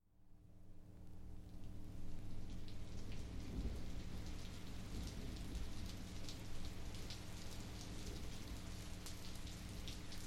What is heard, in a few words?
Steam railroading under thundering skies